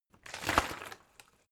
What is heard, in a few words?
bottle water sparse upend; berufe